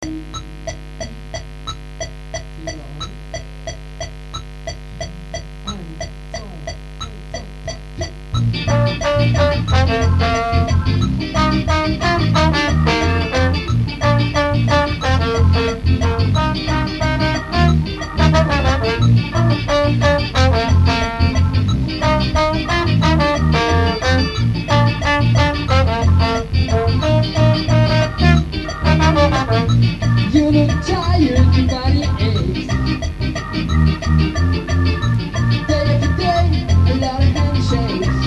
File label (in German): ohne Schlagzeug 180